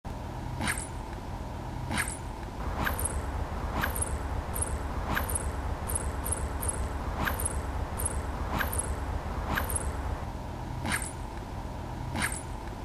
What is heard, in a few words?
Bullets sound